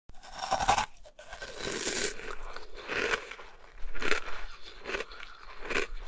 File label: asmr ICE eating sounds